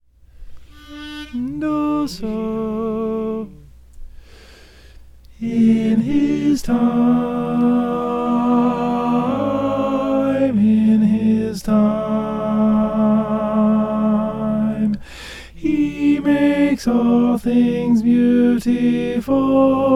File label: alto